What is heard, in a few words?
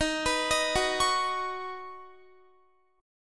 Eb Minor 7th Sharp 5th Eb Guitar; Ebm7#5 arpeggiated